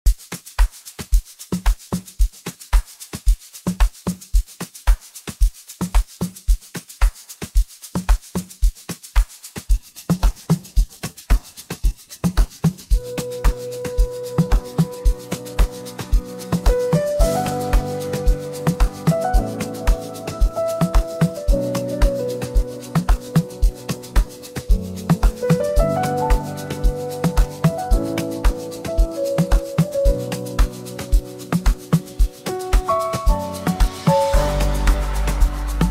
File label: amapiano